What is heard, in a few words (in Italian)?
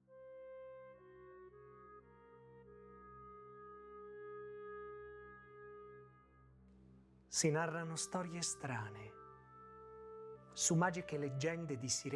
Sirene; Il viaggio di Ulisse; Audiolibro